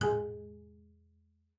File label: bass, G#3; COL LEG; Xsample VOL-5